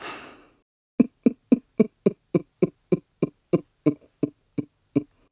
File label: Chuckle Long; Ghirahim chuckling (longer); Ghirahim's encounter with Link in the Fire Sanctuary; Skyward Sword